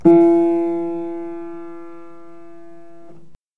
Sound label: Piano